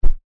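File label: household pillow bamboo throw onto bed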